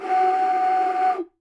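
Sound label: Japanese bamboo flute; Voice Crystal - Steve Reid Definitive Percussion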